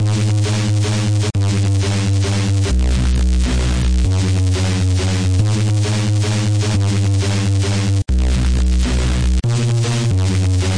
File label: destroy my head evil bass; BASS LOOPS